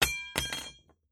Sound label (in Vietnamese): Tieng co le nho roi tren san nha